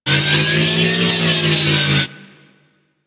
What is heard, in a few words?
Research Facil Target Arm Movement; Warframe; Ambience; Venus Open World; Objective Rooms; Props One Offs